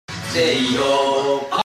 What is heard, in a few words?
screaming